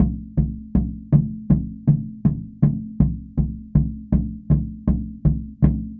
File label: Pow Wow Drum North, Native American; Sonic Foundry (Sony Creative Software) - Continental Drift Aftershocks